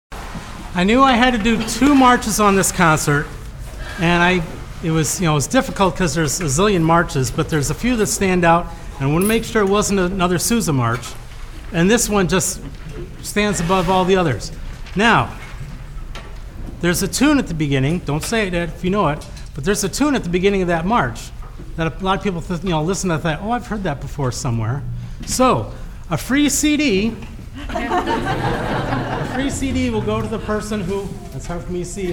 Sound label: Irish Tune